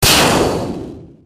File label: thunder